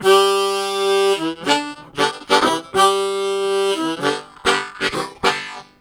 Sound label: Bass, Harmonica; Flintstone Bass; Zero G